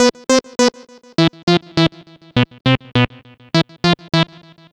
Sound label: Synth Lite; Sonic Foundry (Sony Creative Software) - Rondo Brothers Trip-Hop Loops & Samples; Liberation